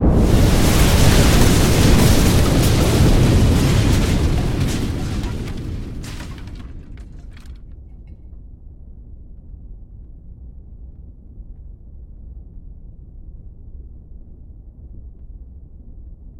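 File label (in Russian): замедленный звук взрыва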